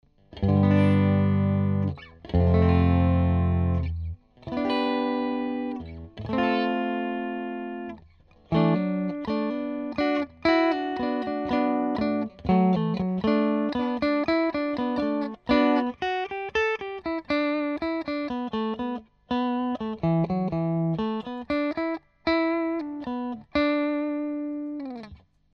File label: Clean Guitar